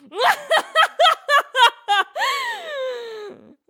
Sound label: female laugh; Voices Emotes